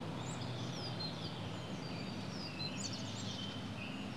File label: birds 1shot; Counter-Strike Global Offensive